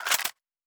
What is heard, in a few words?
Weapon Foley